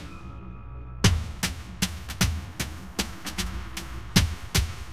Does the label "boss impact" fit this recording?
No